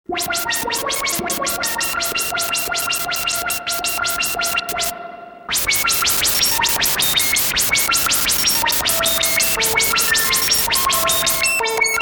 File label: Arpeggio; Studies